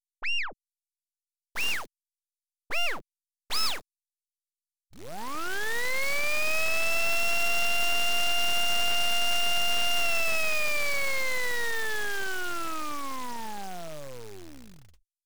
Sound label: motors